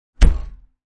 Small Refrigerator Door Closes; Food Prep; Restaurants

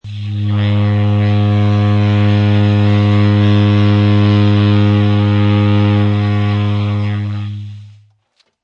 Sax Low Tone, A saxophone low tone; Horns; Sound Effects:Musical

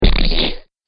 Big Muddy Splat, slimy

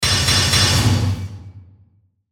Industrial Hits Metallic Pound Bright; Industrial Hit Impact Stamper Slam Production Element Imaging Accent Transition; Mechanical Button Sound Effects

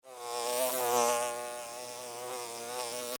Bee, Fly, By, Wings, Land, Insect, Bug; Sound Effects:Animals:Insects; Bees; Blastwave

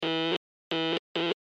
Morse Code G Letter; Blastwave

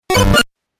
Retro Glitch; Glitch Sound Effects; sound design

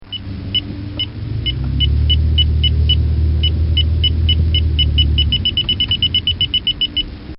Radar Dector; Military

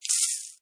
Money, Button sound; Multimedia

